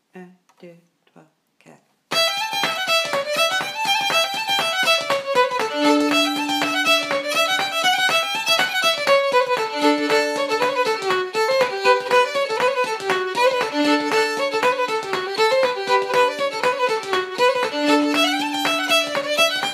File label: Reel du Gaucher dance speed, fiddlers